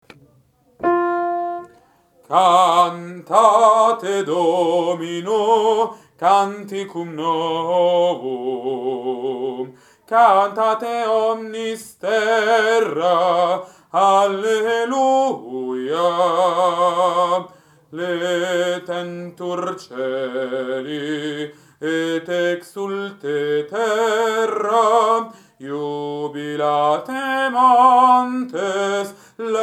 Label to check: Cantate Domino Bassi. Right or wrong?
Right